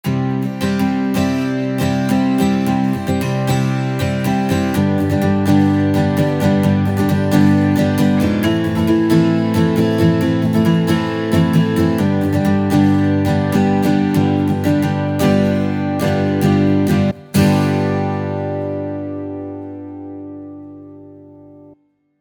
western tempo change

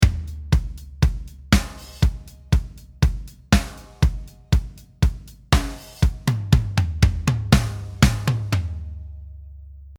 Drums british

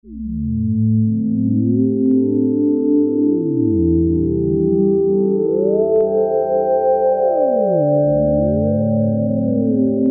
8 blocks digi

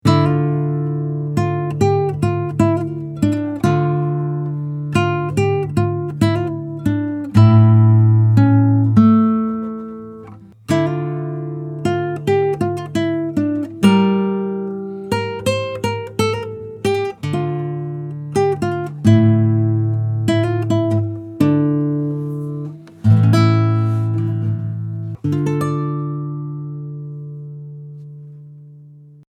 impro d min

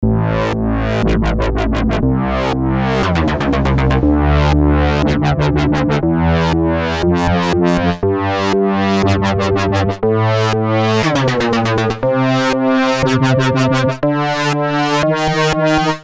8 blocks mixed

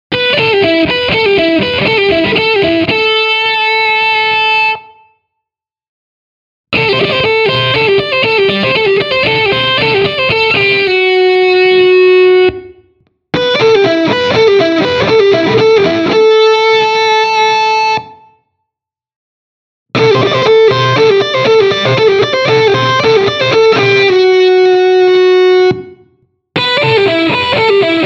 Spanish solo

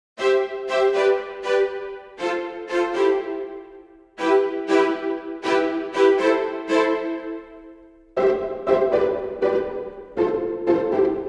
Strings Spic Stac Pizzi

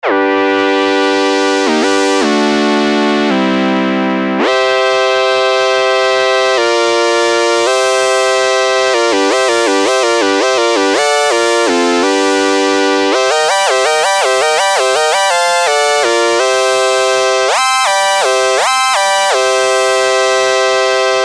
Major Triad Solo

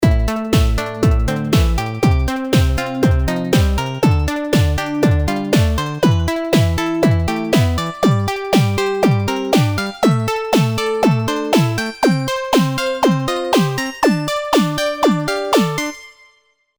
mixed drumsound

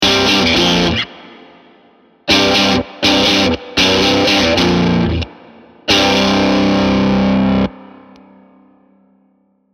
riff grunged; IK Multimedia AmpliTube Orange; AD30; AmpliTube-Software

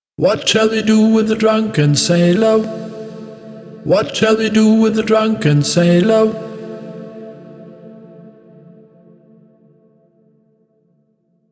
sharp And Soft

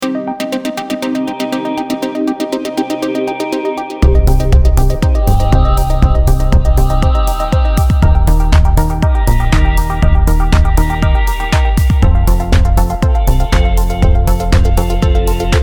Song Fade

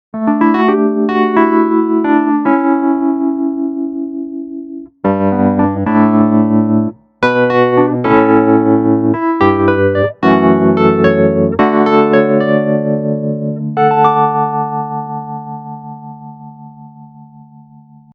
tremolo studio

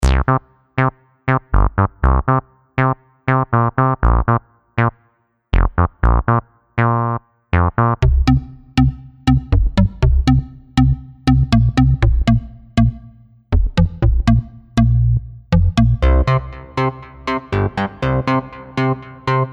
Bass

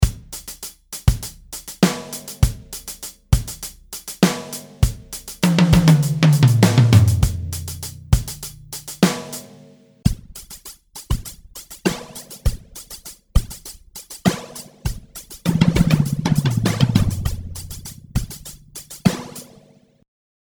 spec shift

